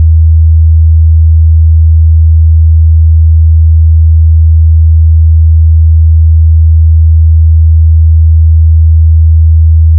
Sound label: 80hz; 384k 32bit